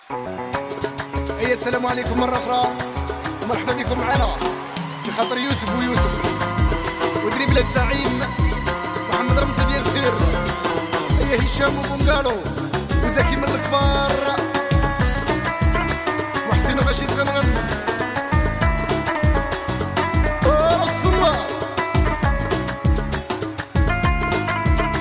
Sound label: dance; maroc